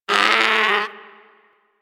Predator Vocal Pain, Hunting Critters; Warframe

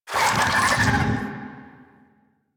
Infested Big Ashen Vocal Attack Death; Warframe

